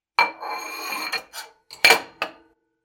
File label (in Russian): zvuk tarelok